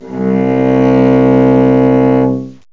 cello, strings; instmnts; Sounds Terrific II

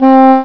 English Horn

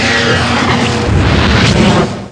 Teleport; Worms DC; WORM-SAMS

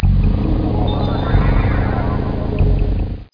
pad

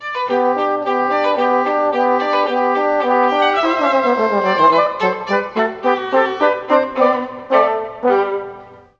Tbn Vla Ob; Juilliard Music Adventure

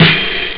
klirr, drums snare; OctaMED SoundStudio V1

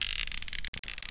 BOW PULL; Dark; thiefdg

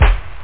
jungle; light bassdrum

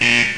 klaxon

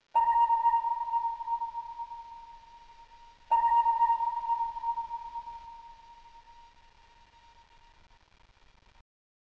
ping; battleships

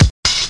KICK AND HAT

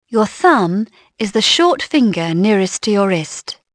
thum; Dorling Kindersley My First Incredible Amazing Dictionary

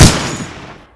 mg42 fire, weapons; Spearhead Demo; MacFormat 2003 June

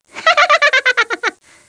FAST LAUGHTER, CARTOON VOICES

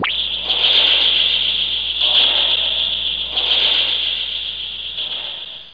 Pulse; Kroll

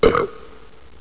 BURP

